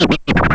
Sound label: Wild Scratch; Proteus 2000; Multisamples; Digital Sound Factory Proteus 2000